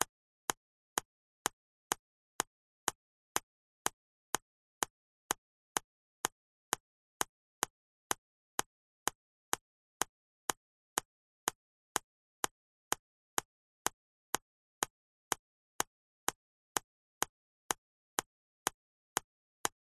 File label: nature water drips steady med polystyrene